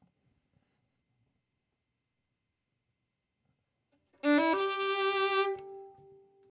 Violin

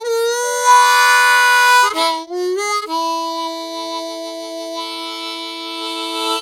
Harp Excerpt; Verdigris Music

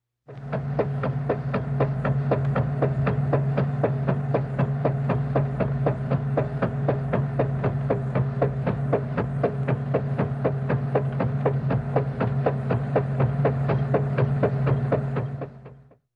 Windshield Wipers

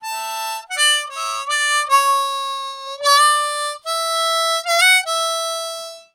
Harp Excerpt

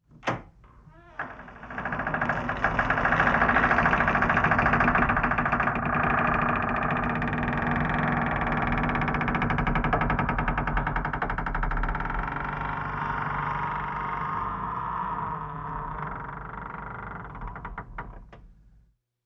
Creaking Door Open

